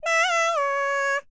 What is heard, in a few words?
HIMALAYAN, World Voices; ILIO; Vocal Planet VOL-5